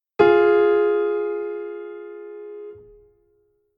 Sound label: augmented second